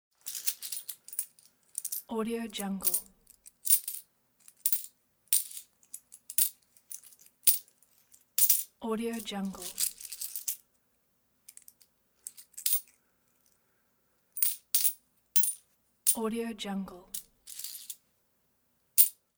counting coins in hand

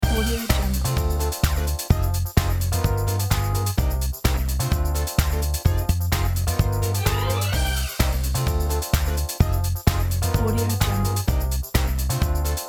classic dance club logo

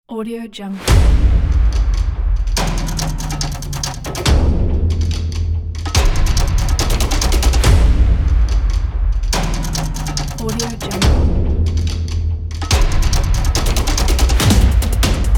epic industrial drums; musickidsound

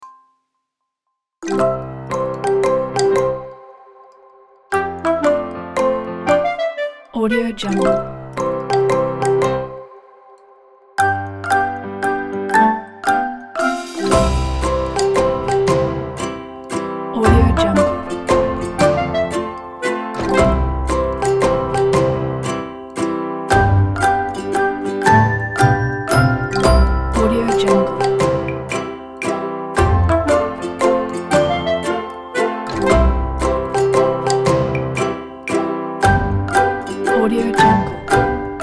happy childhood

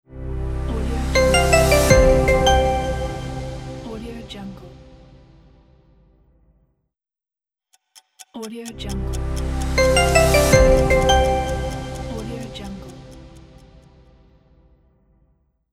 corporate intro logo ident

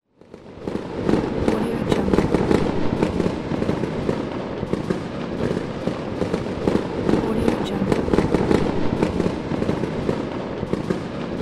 firework

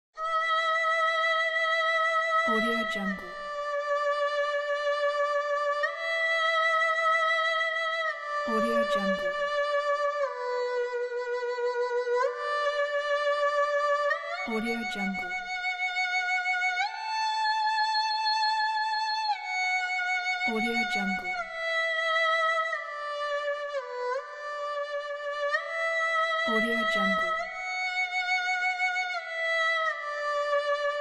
chinese erhu melody

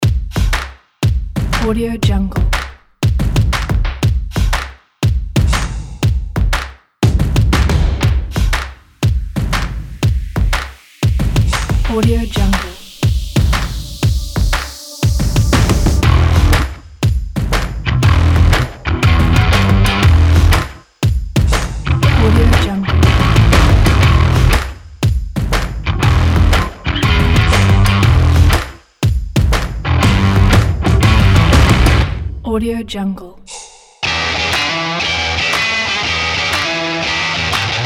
promo sport rock kit

